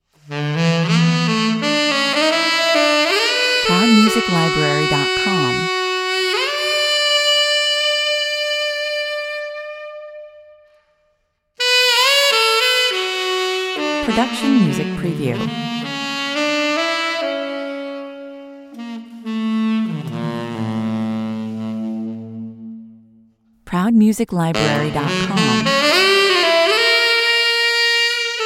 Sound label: sax solo